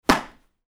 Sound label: glove catch